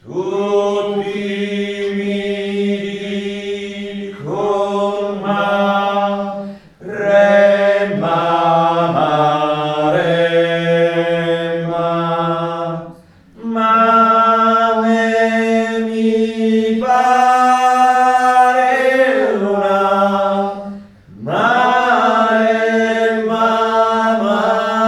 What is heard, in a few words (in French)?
tenors bas; Maremma; St Jean